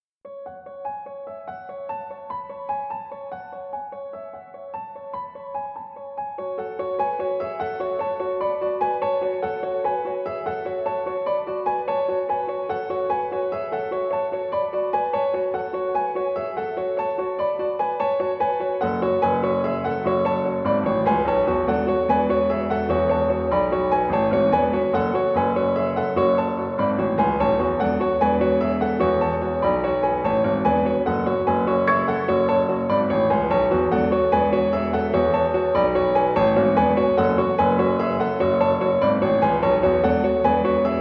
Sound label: Cover, piano